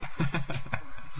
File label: Font laugh